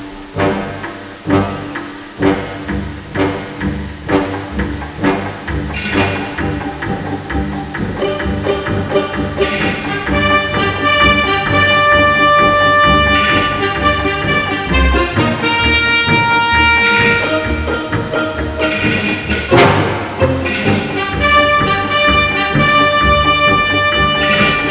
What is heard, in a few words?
song; klingonoutpost